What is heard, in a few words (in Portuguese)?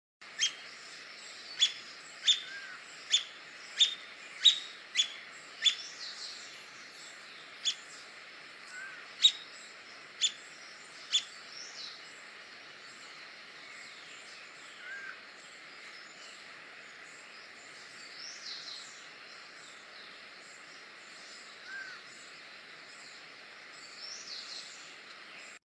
Canto do arapacu verde